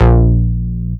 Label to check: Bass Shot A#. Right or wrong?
Right